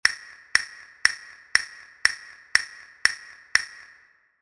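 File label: castanets sound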